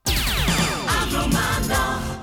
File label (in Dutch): omroep jingles